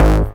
Synth Bass; Vengeance Samples; VEE Synth Shots

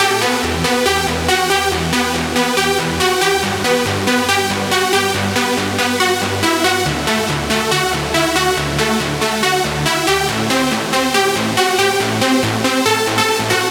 Mainlead WET 140BPM, E minor; Melody & Synth; Vengeance Samples; Incast Kit

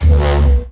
Synth Shots; Vengeance Samples

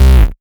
Bass C, Offbeat Bass

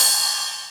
Cymbals Ride; Vengeance Samples

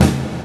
Minimal Perc; Minimal Percussion

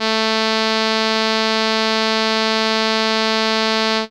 Trumpet A3, ARP Solina Unmodulated; musicradar-synth-samples

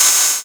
Cymbals HH Open; Vengeance Samples

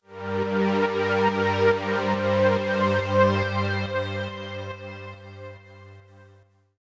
140BPM Pad Intro, Gminor; Unlooped; Vengeance Samples; VTS1 Devastating Truth Kit

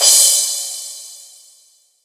Crash; Vengeance Samples; VES1 Cymbals

